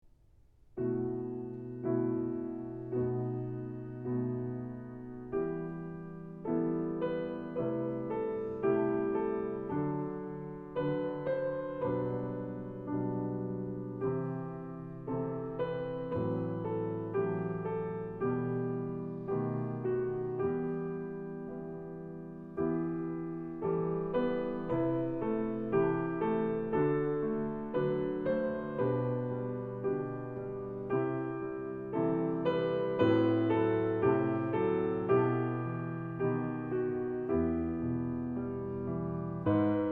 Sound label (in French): piano